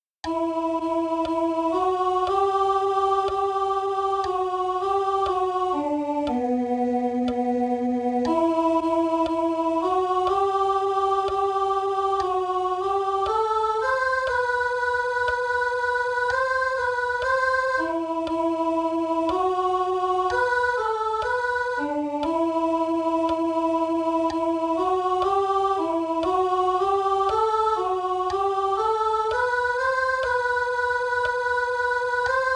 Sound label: Tu sei la mia vita SOP